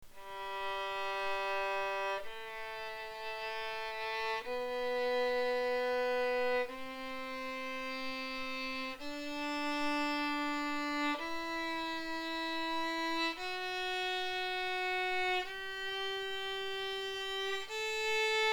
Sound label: German Strad Copy